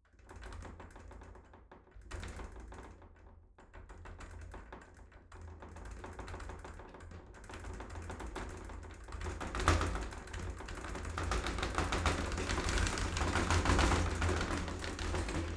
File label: shower slide door rattle long